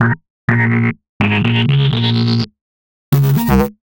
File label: Broken VCF, Bass; Sonic Foundry (Sony Creative Software); Underground UK House & Electro